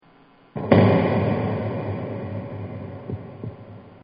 Explosion Boom; Explosions

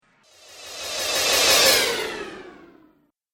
Magical Impact Accent; Magic Sound Effects

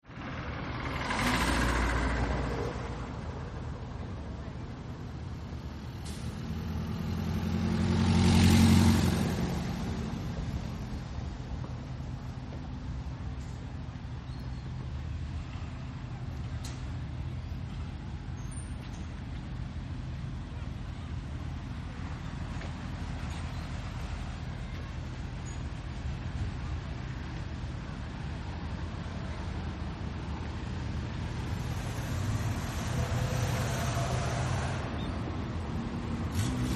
City Ambience Daytime, light traffic, Chicago; City Ambience 1; Cities

